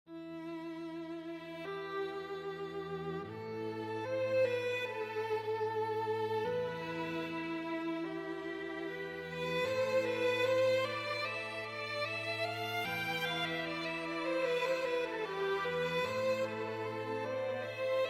Medieval Canon Phrygian Requiem for String Quartet